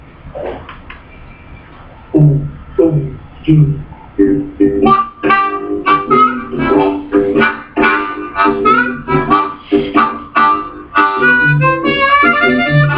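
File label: harp exercise, blues harmonica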